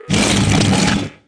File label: amazon statue hit; DAoC; Toa